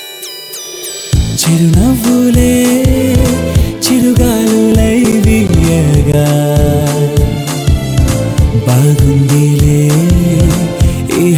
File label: Ringtone; Kalyana Vaibhogame Movie